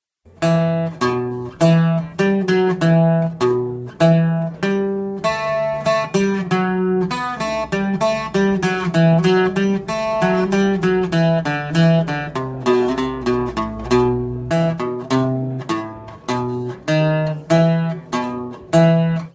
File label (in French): contrechant, banjo